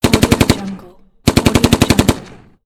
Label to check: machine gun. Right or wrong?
Right